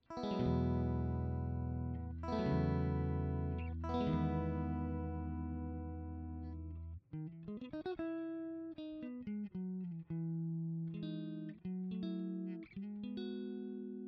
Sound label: Clean Chords DI Balaced Out